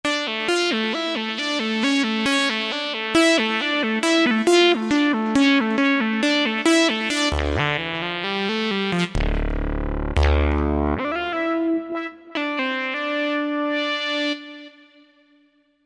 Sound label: Sine, Roland V-Synth; Factory Patches; 201 Sine ahead